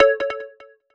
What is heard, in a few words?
Star Alert; Sony Ericsson; C901; PlusFourSix; Lars Edvall